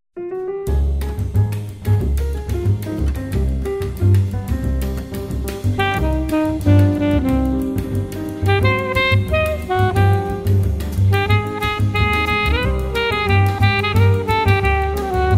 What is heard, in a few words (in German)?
Klavierkonzert C-Moll Allegretto